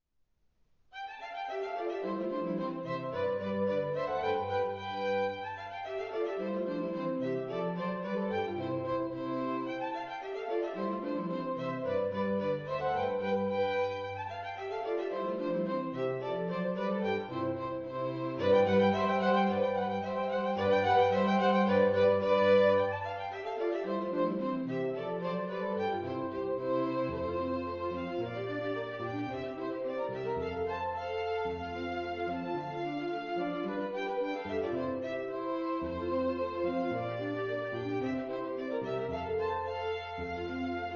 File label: Miniature Trio in C Major